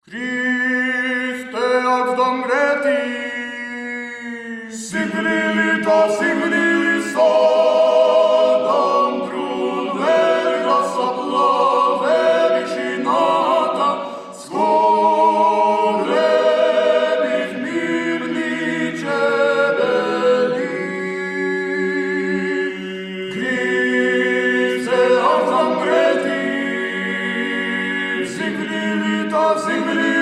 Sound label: The Paschal Troparion (Georgian Chant)